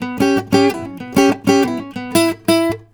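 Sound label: baritone lick E, Blues Guitar; ILIO; Fretworks; 120 BARI LICK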